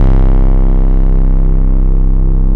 bass, 150 BPM, drum & bass; Ueberschall; Drum & Bass Resonance

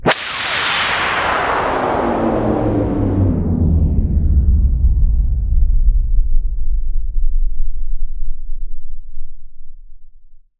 INCOMING, WARHEADS; Spectrasonic Distorted Reality 1

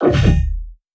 Nice Kick

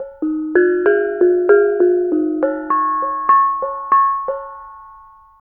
BONANG; Spectrasonics; Heart of Asia; BONANG PH

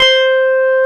Korg CX3, C 1

